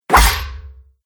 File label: Samurai Sword Powerful Strike